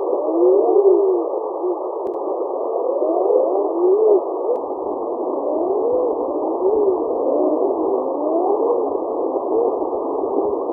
common eider

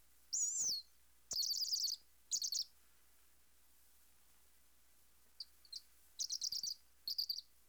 snowy owl, oiseaux

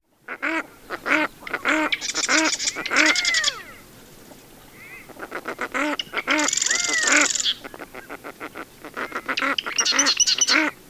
redhead, oiseaux

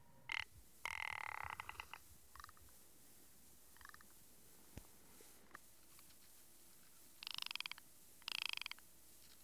ptarmigan

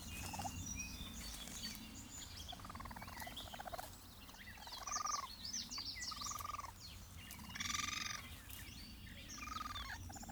raccoon

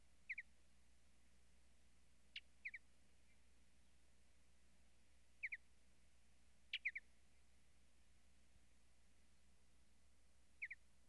mountain bluebird

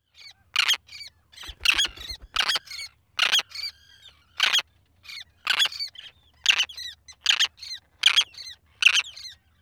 roseate tern, oiseaux

